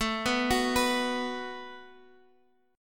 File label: B 5th A, chord; B5/A; arpeggiated; JGuitar